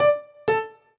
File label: count down; lila